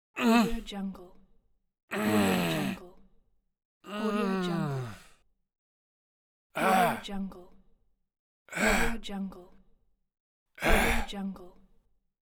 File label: male groans and grunts